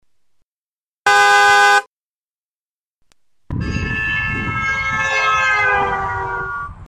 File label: doppler